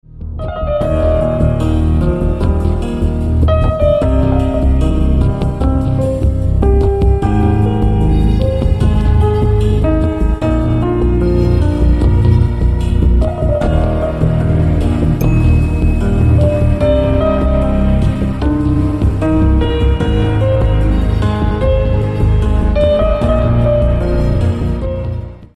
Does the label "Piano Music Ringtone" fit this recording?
Yes